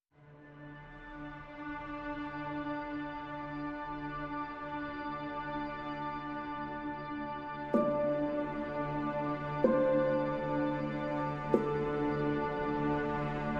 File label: Lead Piano and Strings Only